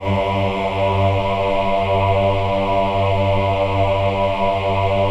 VIB G2, VIBRATO, Voices; Spectrasonics

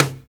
TOM STONE, Real Toms, Dry Toms; Roland L-CD701

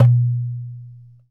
Dumbek, World Percussion One Shots; Sony CS

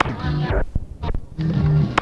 TAPE START; ENVIRN-AMB

